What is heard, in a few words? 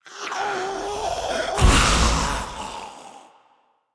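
ghost trap attack; monster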